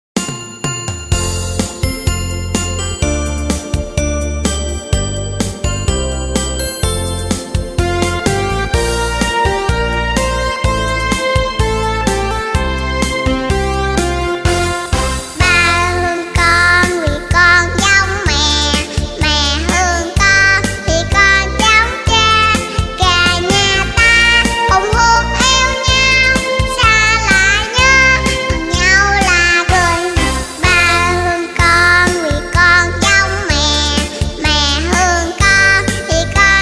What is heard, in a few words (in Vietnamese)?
bài hát